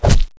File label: pain, player